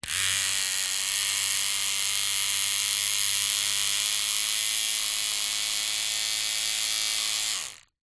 household facial hair trimmer with long hair attachment run short no cutting